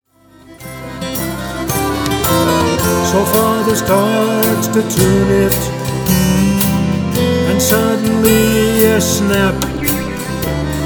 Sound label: 5 STRING GUITAR